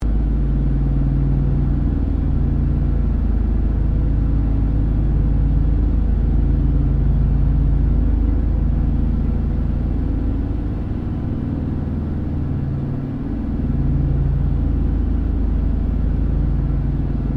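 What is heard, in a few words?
AIRCRAFT LOCKHEED L-1049 SUPER CONSTELLATION FLYING INTERIOR; 1955; Connie, Super Constellation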